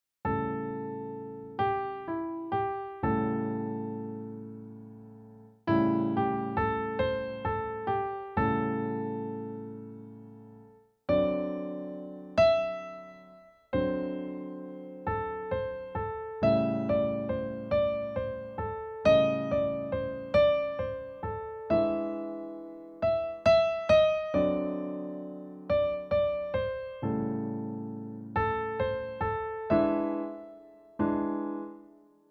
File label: Blues